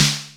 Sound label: snare classic